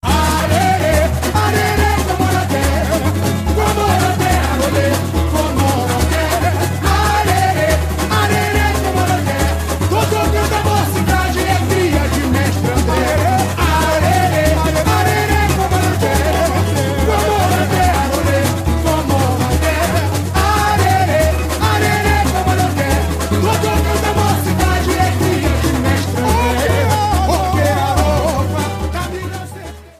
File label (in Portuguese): Batuque; Batuque ao Caçador